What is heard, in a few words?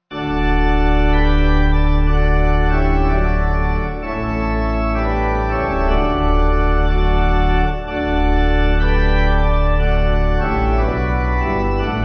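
Pipe Organ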